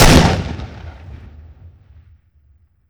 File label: shotgun fire; weapon; gesource; npc